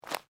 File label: FOOTSTEPS SNEAKERS UNDERBRUSH STEP; Footstep Foley; Human